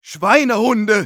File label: medic jeers, de